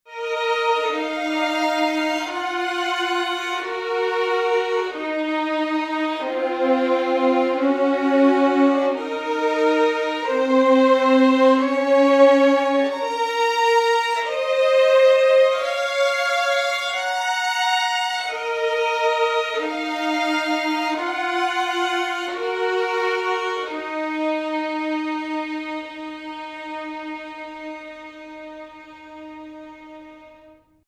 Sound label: violins; ortf